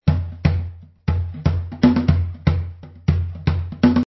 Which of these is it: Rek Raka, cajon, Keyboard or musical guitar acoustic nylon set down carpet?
cajon